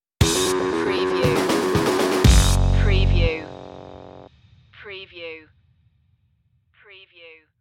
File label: funk stinger